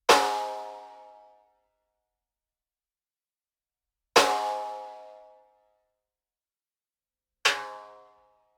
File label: cartoon ringing hit